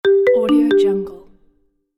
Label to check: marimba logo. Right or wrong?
Right